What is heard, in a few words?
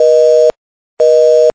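busy signal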